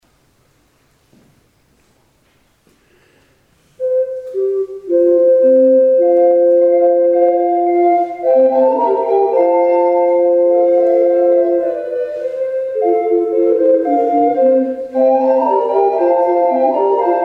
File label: Gemshorn